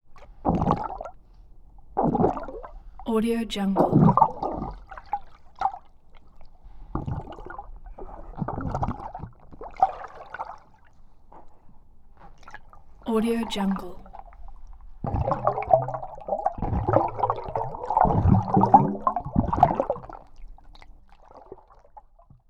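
underwater movement bubbles close-up air swishing by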